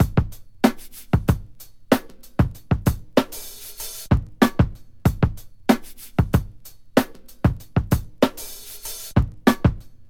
95 bpm drum groove e key

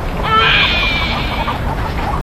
distant site alarm

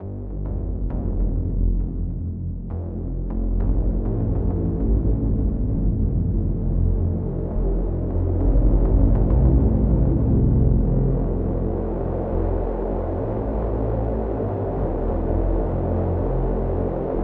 bottom dark texture; synth; instruments; loops

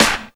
clean steel snare drum d key

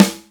dark sounding snare drum g key

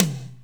hi tom sound c key

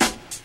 hip hop steel snare drum d key

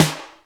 bright snare drum sound d sharp key; snare drum samples

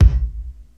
verby kickdrum sound g sharp key; kick drum samples

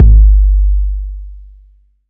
round bass drum one shot G key; kick drum samples

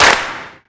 small reverb clap sound g key

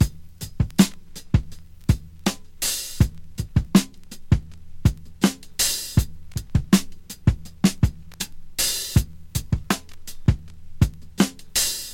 81 bpm hq drum beat e key

